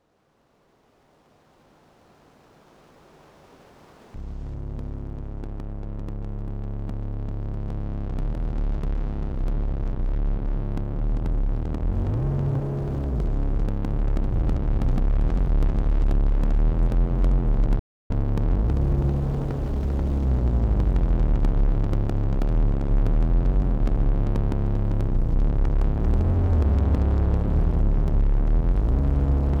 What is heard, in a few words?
rats bass; rats have gone smarter